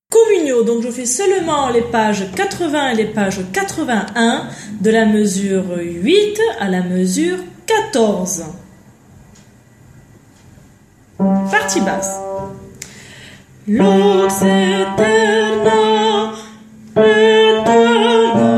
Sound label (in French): Basse; Requiem; Lux Aeterna; Mozart